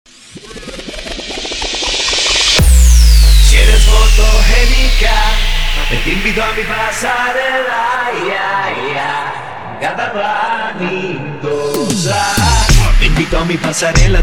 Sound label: Open Show 95 BPM